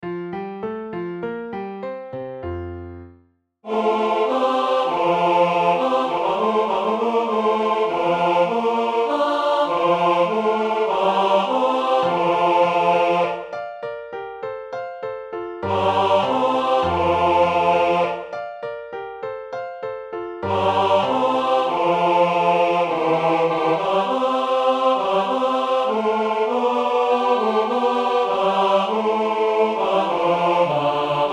Bass; Alcina; Oper

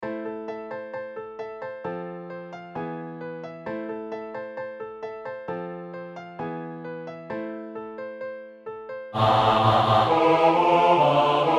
Bass

